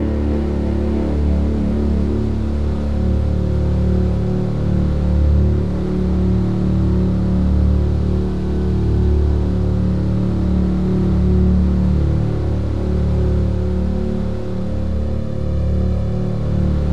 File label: CELLO